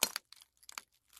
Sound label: glass; Pitch Black - A Detective's Demise